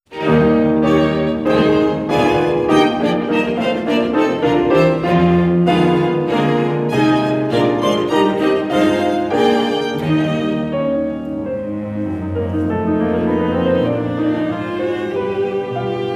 Quintet for Piano 2 Violins Viola and Cello In Eb Minor; I. Allegro brillante; Schumann; Op.44